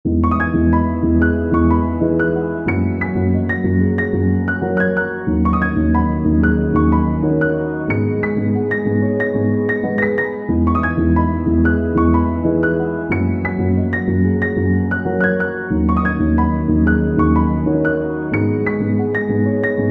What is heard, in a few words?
Loop Piano